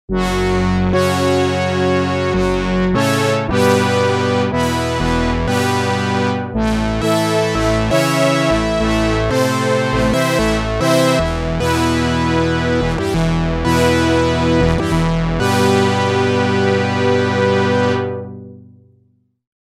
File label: Roland JP08 Dual Mode